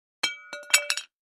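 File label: Large Tile Square Pieces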